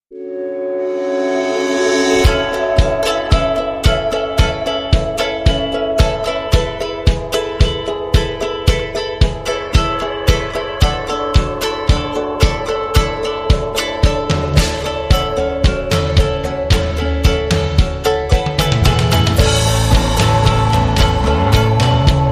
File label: Tiny Guitar